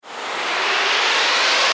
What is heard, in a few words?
UI jackpot line; Brazil; SlotPioneer